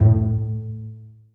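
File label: basses pizzicato a1; Sonatina Symphonic Orchestra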